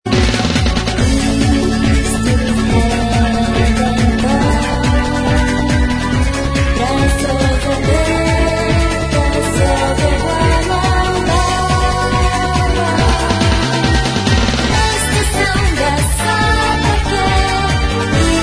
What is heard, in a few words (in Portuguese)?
samba